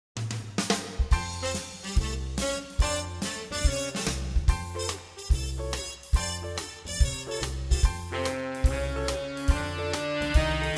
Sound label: Jazz